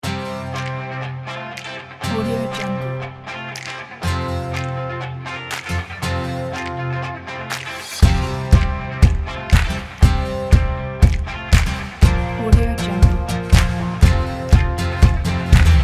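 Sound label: summer travel rock energy; karmusic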